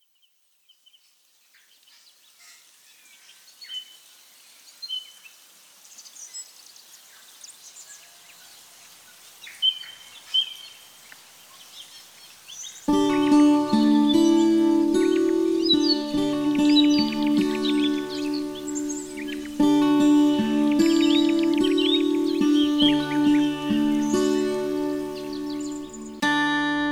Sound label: music smorgasbord ambiance rhodes